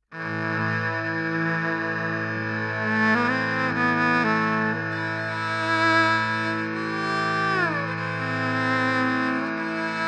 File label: Superego Cello